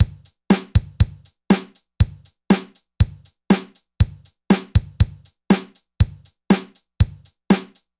loop 120, Acoustic

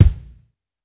Rock kick; Reference Beat

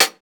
hihat, house

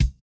no effect j city pop 1980 kick

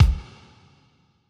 hall resonance kick

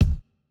Jrock kick

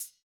Jrock hihat

